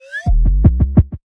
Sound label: Caramel Click Sound; Jolly Battle